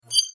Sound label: Rollover Squeak Short